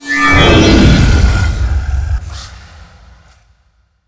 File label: ready, weapons